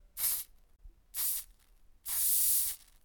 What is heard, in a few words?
Hairspray Spritz